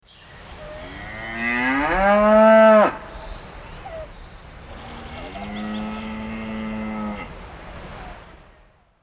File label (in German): Rind hinterwaeld, Tierstimmen